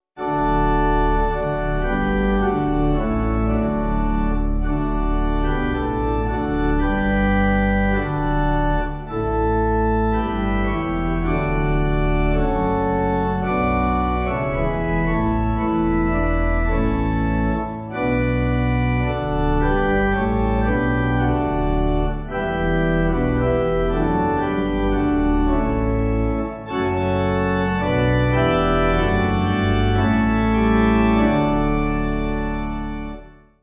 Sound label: Pipe